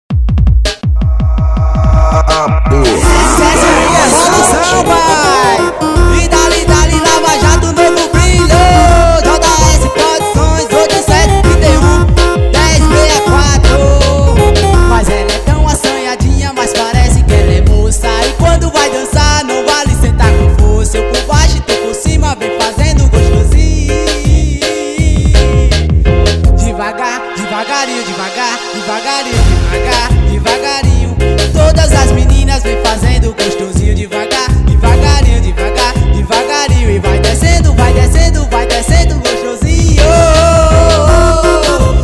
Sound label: swing